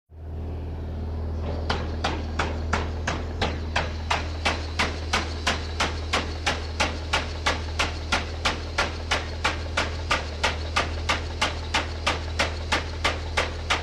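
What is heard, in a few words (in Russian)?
звуковые эффекты конструкции бурильного молотка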